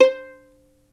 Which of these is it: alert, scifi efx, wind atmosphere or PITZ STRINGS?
PITZ STRINGS